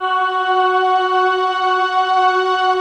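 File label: slow orchestra pad, Slow Pad 5th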